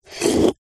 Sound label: household cafetiere plunger lift coffee inside; zapsplat